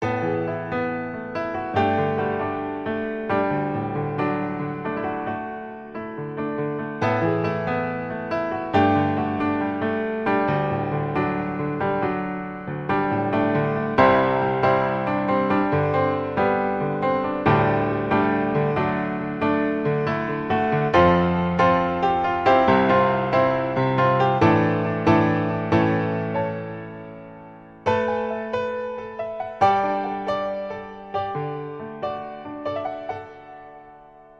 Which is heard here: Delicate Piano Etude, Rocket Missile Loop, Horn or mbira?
Delicate Piano Etude